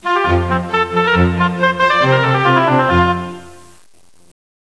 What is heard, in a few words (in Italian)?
suonerie classica; musica classical